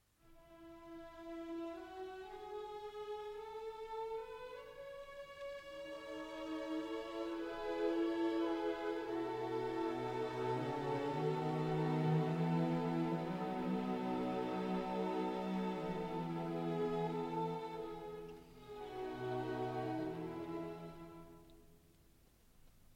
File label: Piano Concerto No. 1 in E Minor II. Romanze (Larghetto)